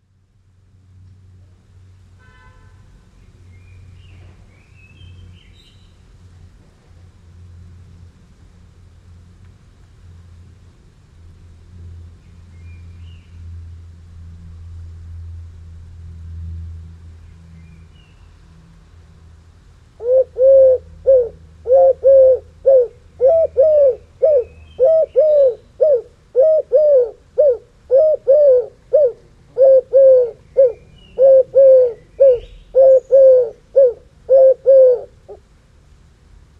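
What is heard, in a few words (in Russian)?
turtle dove cooing on the nest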